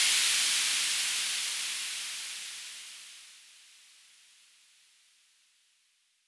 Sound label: noise